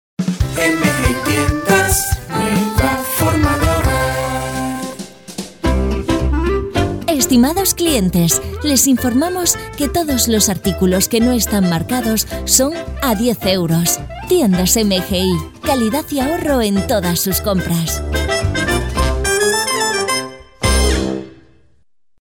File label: JINGLE INDICATIVO